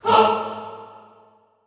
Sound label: HAH A2, Classical Choir, SHORT HAH; Best Service